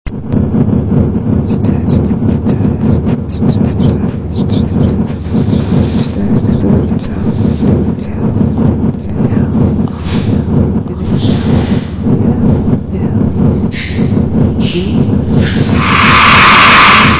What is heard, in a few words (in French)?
Bruits de communication